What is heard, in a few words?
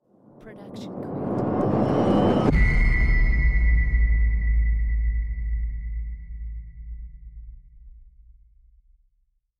cinematic rise hit; soundscrate